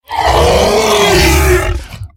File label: sadness